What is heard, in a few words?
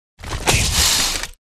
Scolopendra